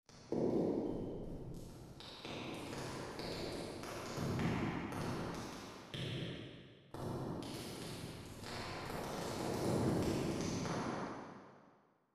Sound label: Large Hall; reverbs; renaissance